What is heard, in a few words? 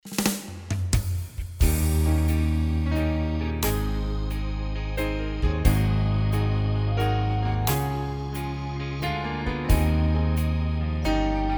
Amazing Grace Instrumental; Public Domain